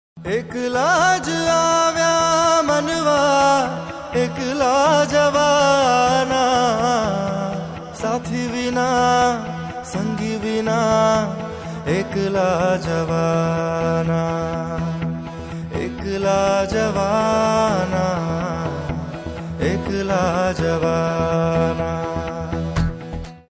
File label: Bhajan Mashup gujarati ringtone